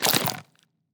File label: Gore; Damage